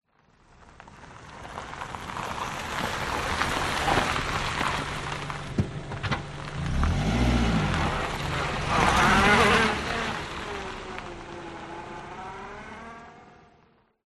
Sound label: vehicles car approach slow stop then reverse back dirt gravel